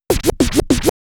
Scratch, Trance; Sonic Foundry (Sony Creative Software); Trance NRG VOL-1

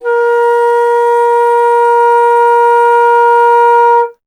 Alto Flute

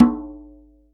djembe, drum machines

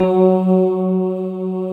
SYN K-2000, Roland

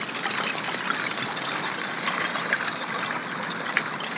Icy Stream, On The Glacier

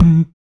Body Perc

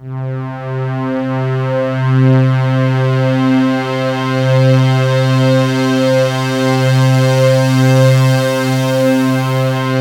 PAD C4; USB Soundscan vol.05 - Explosive Jungle; AKAI

